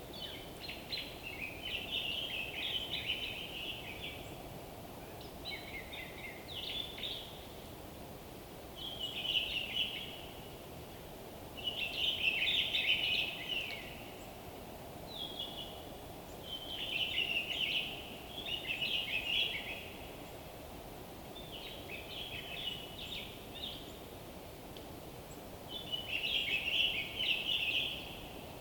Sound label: WINTER Forest day blissful bird song resonating through the frozen wood North; North Laurentian